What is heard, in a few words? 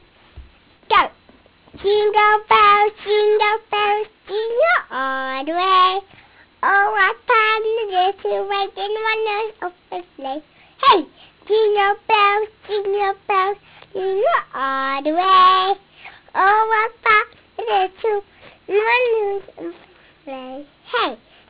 jingle